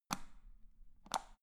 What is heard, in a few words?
power button turn on off hard